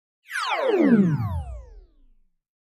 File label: Descend High Frequency Digital Descend